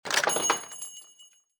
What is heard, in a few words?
Ring; mahouteq